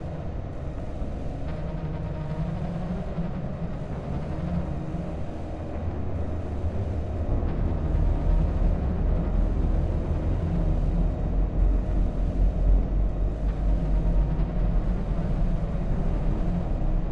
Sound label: (Ambience) level